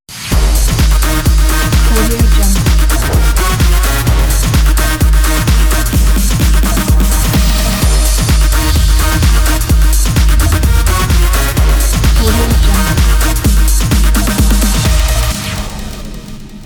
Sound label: dance logo